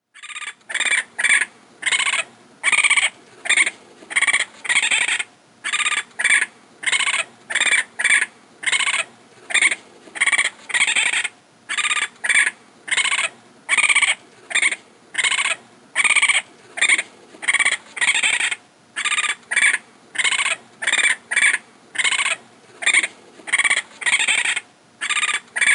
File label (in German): Waschbär Schnirken Keckern; Die Urheimat des Waschbären liegt in Nordamerika.